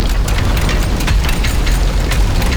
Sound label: Cylinder Loop; Warframe; Entrati; Vaults